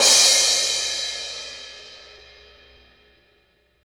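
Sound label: cymbal, disco; Best Service ProSamples vol.48 - Disco Fever; AKAI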